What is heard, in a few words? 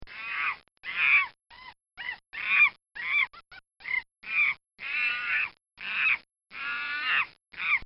Monkey Chatter; Monkeys; Animals